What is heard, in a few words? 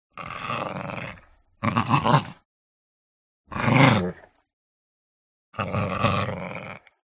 DOG Chihuahua Snarl Fight Soft; ANIMAL; smartsound